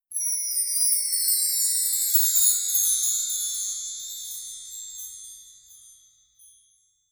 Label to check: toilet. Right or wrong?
Wrong